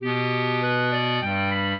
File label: minuet, clarinet; Mozart